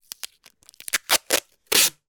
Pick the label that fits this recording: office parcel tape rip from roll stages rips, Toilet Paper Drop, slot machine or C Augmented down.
office parcel tape rip from roll stages rips